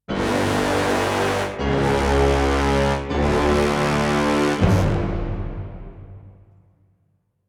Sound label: Epic Orchestral Stinger